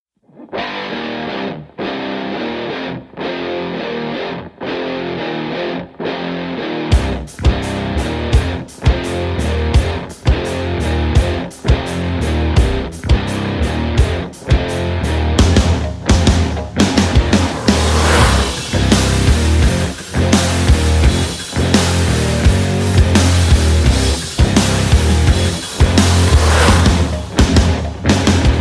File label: no guitar solo